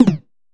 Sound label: player unready; Multiplayer; osu